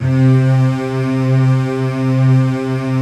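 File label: PAD, atmospheric pads; Best Service; XX Large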